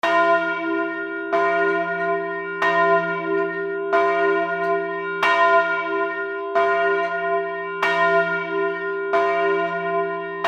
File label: church-bell low pitch